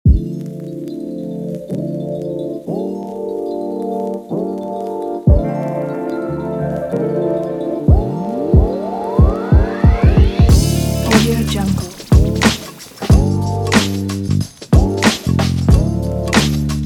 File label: hip hop vlog positive